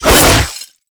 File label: Drill Scythe Spin Whoosh; Warframe; Grn Drill Scythe